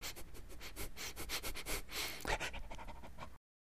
Dog Sniffs Pants; animals dog

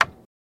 Hitting Bingo Pole

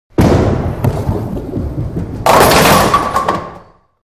Bowling Ball Rolls

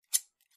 clippers snip; garden shears

